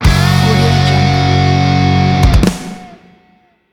rock bam

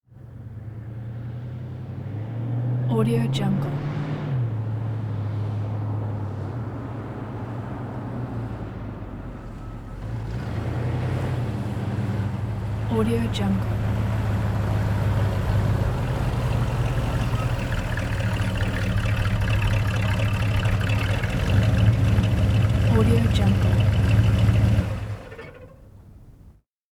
1969 chevrolet chevelle convertible; prosoundfx

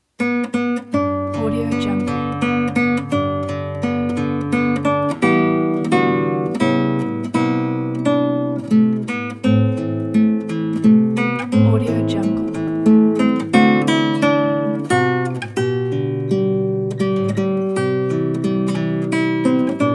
classical guitar lullaby; brahms

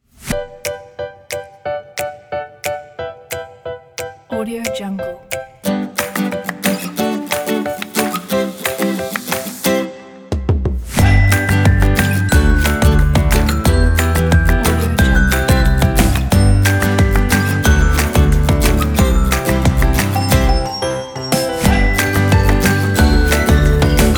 happy music

